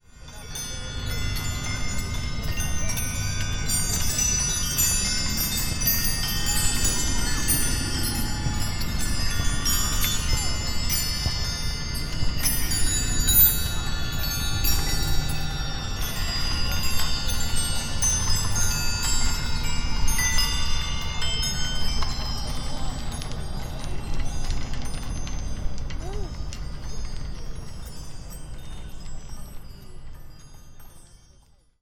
sonic reed beds

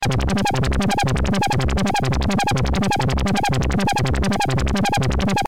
modular synth

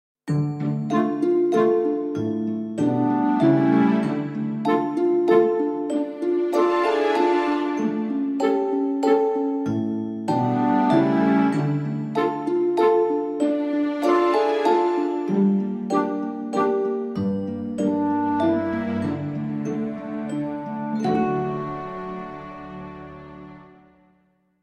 backing track

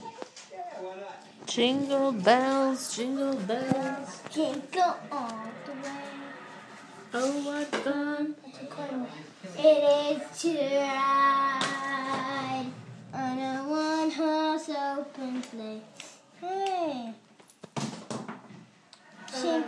jingle bells

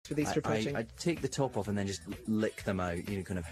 lick

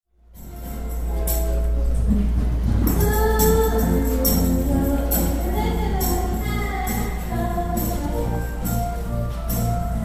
karaoke comic book store osaka; mandarake

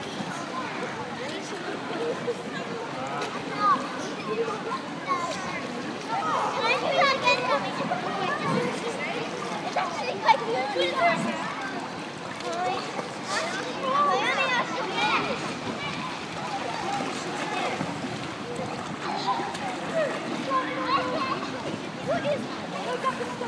random pool sonics

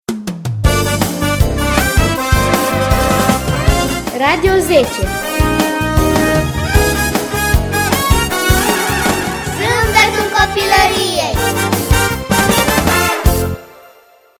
radio jingle

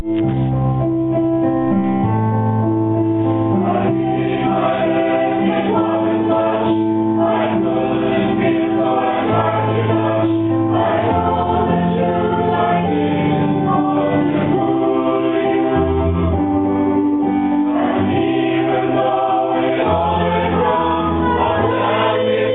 choral concon fusion hallelujah ending

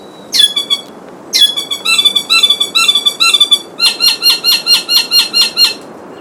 saddleback tieke male territorial call

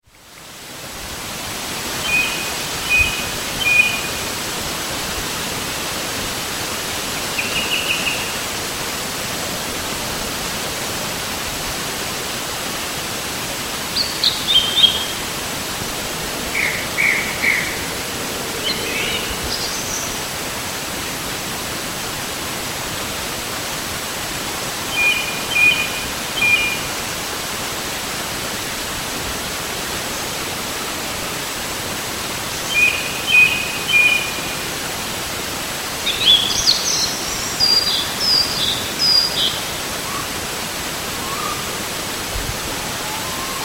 woodland song

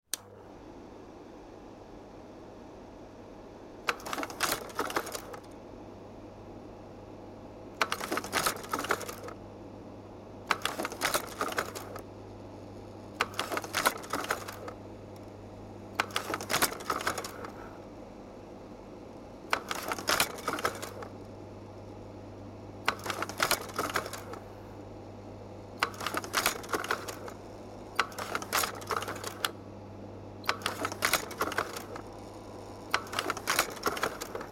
Leica Pradovit P 150 slide projector

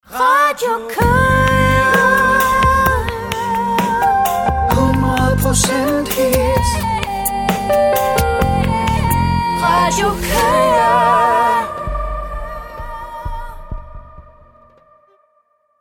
jingle; Radio Koge; produceret af Lydstudiet Aros

